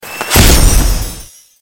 chest

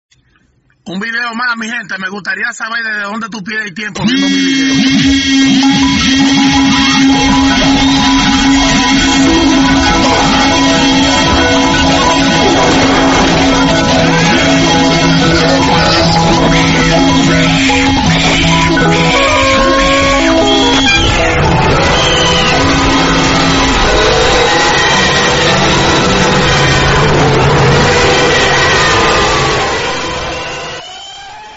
ohio ringphone

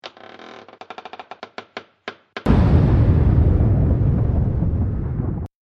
door creaking

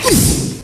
buzzer; familienduell

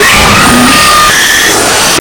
jumpscare sounds at once

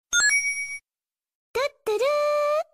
cute ringtones and notification sounds

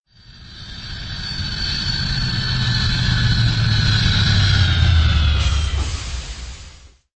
falcon landing

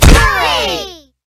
kick sound effect; bluey

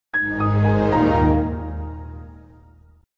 microsoft windows xp shutdown sound

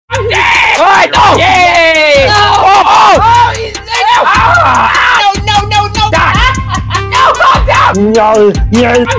screams

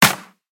clap

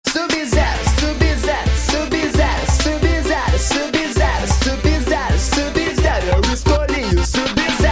funk

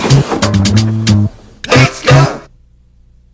punchline music; i love toy trains

